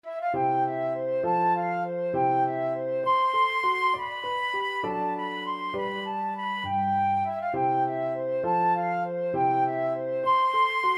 Gigue G Major, flute

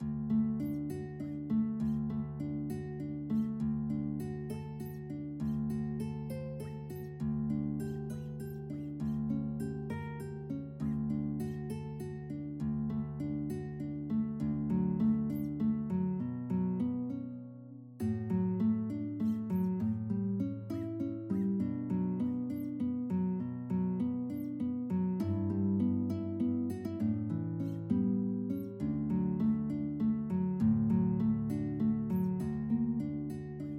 ave maria, guitar

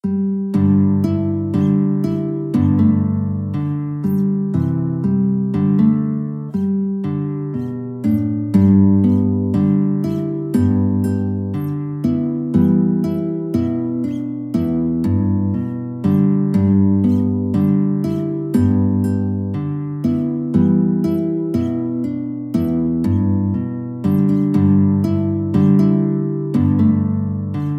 guitar

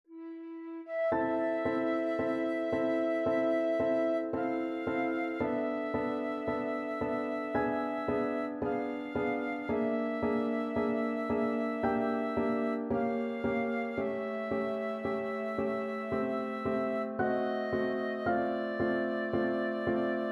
chopin prelude, flute

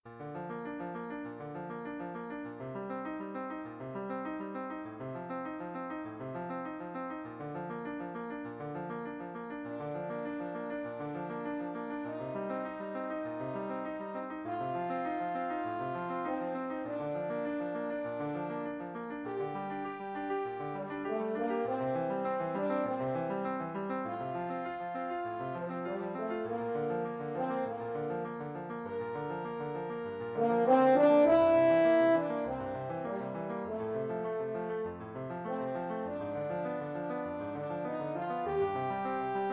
french horn

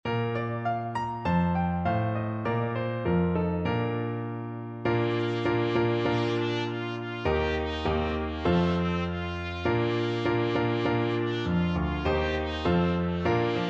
trumpet

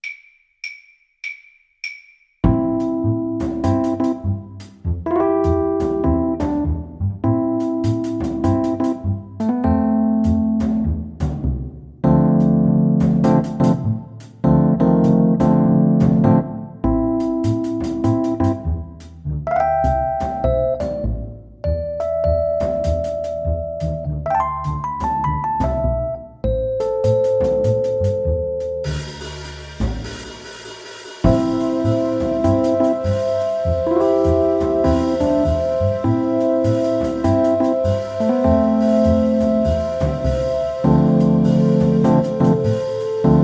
Piano

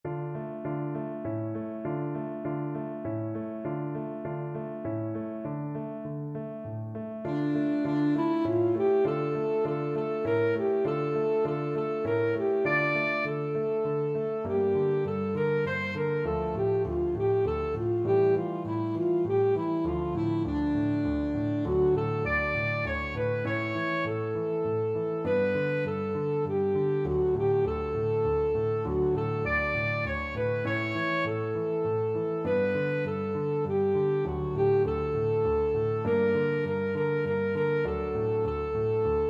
saxophone

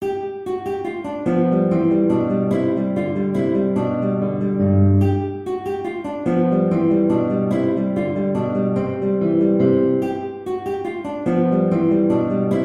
guitar

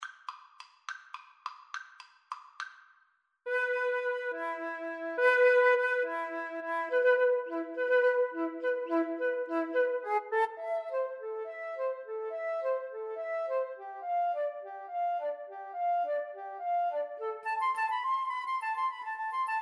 flute duet

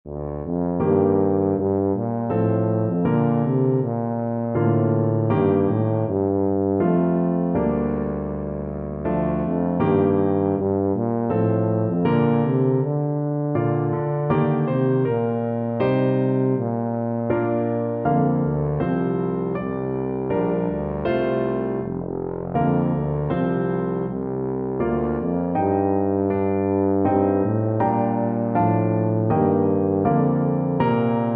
holst jupiter TUBA

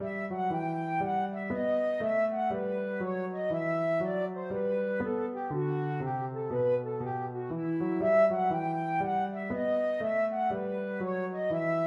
bouree, flute

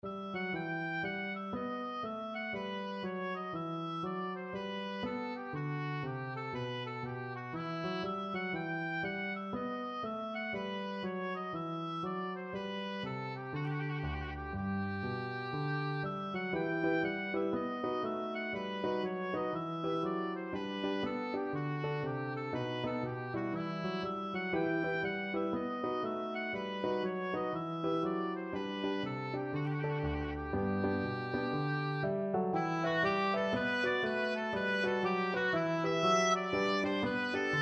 bach bouree, oboe

